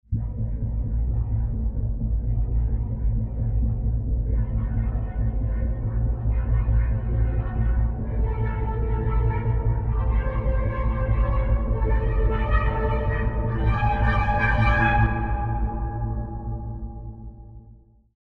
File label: Creepy; HydraSynth